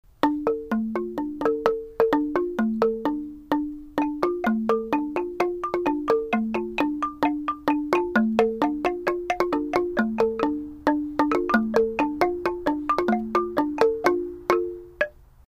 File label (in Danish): 3 klang